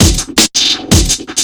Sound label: 165, drum loops, drum & bass